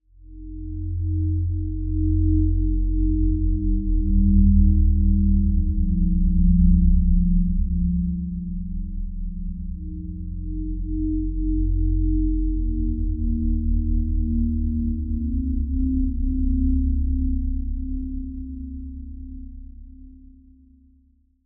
Pad; Routed Sound; Sylenth1 Expansion - Star Scavenger Vol.1

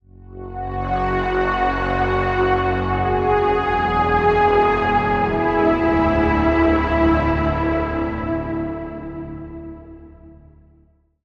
Pad; Routed Sound; Spire Expansion - Reality Maker Vol.1

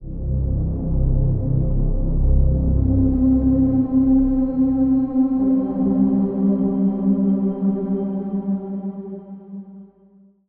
Pad; Dark Vendetta; Routed Sound; Spire Expansion - Noble Gangster Vol.1

